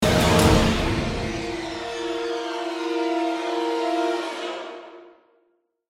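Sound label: Music Trailer Crash Fail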